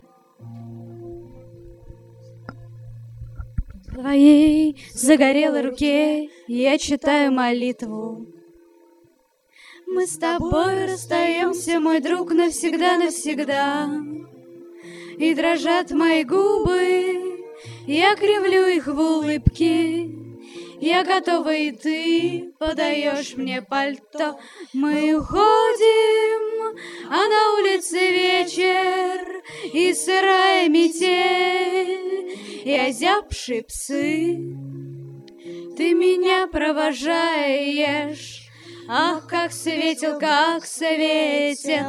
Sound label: Romance acoustic live